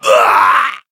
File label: damaged